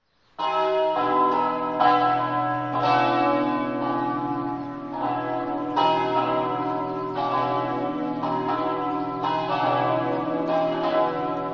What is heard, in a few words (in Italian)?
sedegliano, campane